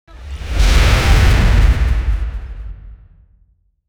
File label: intense cinematic; orchestral hit